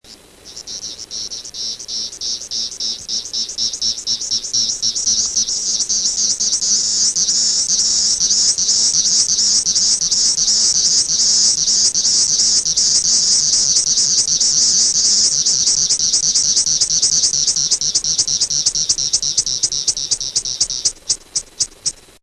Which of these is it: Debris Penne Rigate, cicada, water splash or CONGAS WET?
cicada